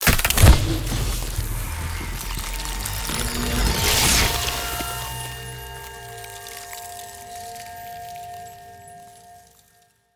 relic activation cryo core; Stellaris; ancient relics; yuht

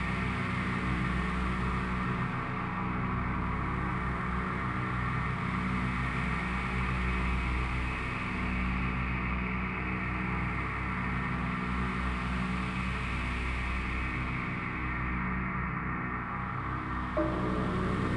matter decompressor hum treble; Stellaris; megacorp

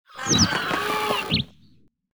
greetings avian; Stellaris

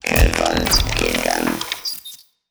lithoid portrait machine; Stellaris; lithoids; portraits

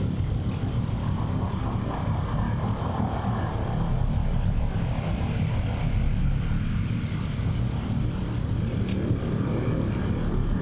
ship corvette idle; Stellaris; machineage; ships

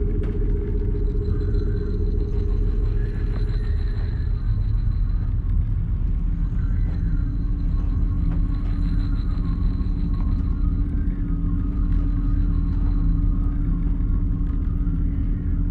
ship construction ship idle; Stellaris; shroud; ships

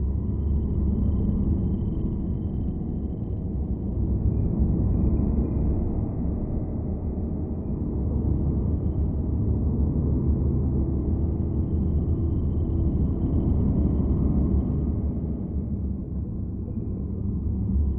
ship transport ship idle; Stellaris; aquatics; ships

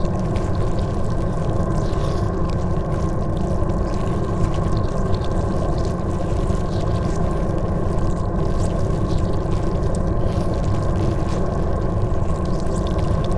ant ship mauler idle; Stellaris; biogenesis; ships

